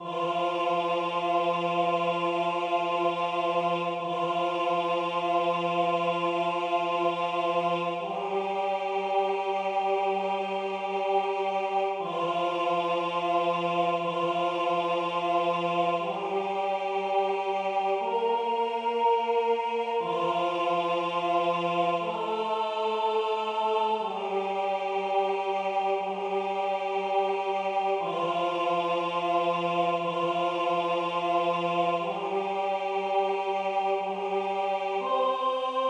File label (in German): Gesang Stimme